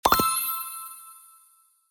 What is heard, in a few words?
confirm click